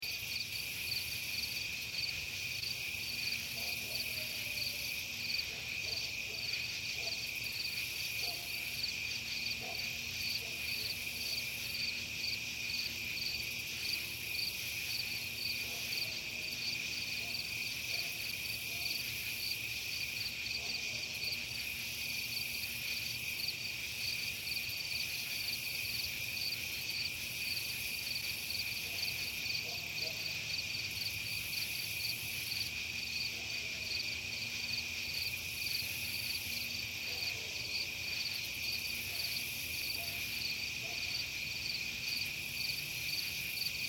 Ambience Subdivision Night Insects Distant Dog Loop; Outdoor; Outdoors